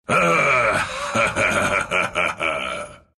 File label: laugh ru; Dota 2